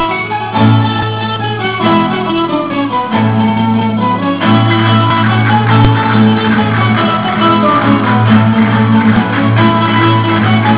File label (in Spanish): seguidillas